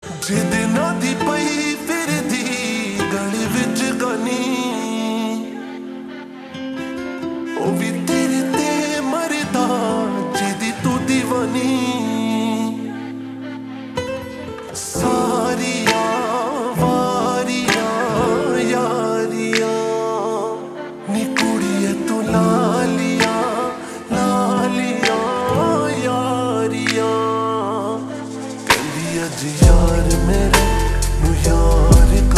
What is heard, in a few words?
Ringtone Slowed Reverb